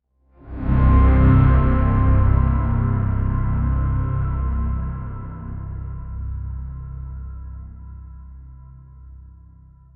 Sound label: dark dissonant strings wi